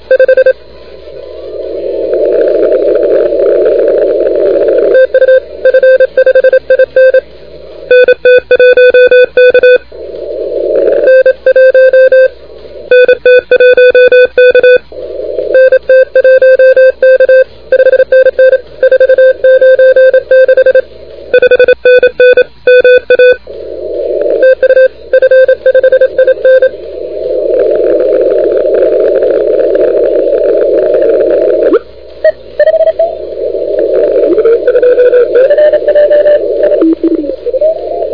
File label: CW 28MHz; ARRL10M